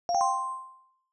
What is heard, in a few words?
notify special focus new